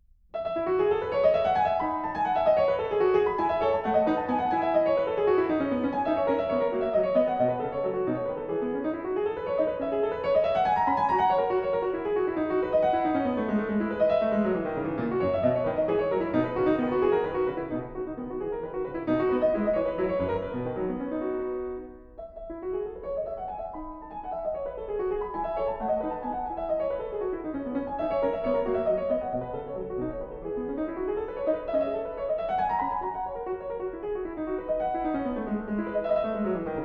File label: French Suite in E-dur - Courante, Keyboard; French Suite No.6; BWV 817